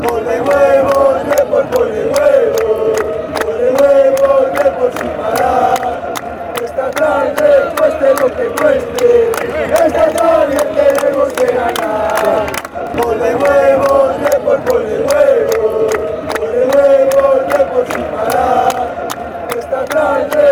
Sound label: with, fan chants